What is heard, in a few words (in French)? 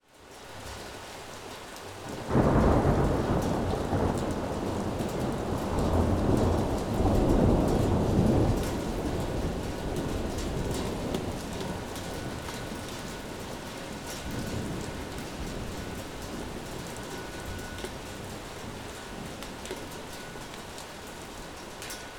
orage